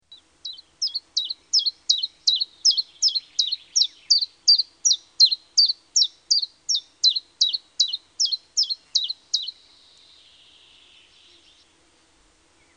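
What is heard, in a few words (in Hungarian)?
Csilpcsalp füzike; Madarak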